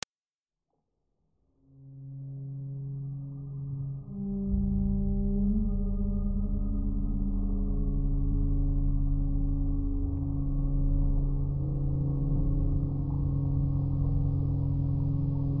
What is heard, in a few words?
LONG WAVE AUDIO SOUNDSCAPE